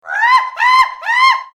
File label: cute Monkey Voice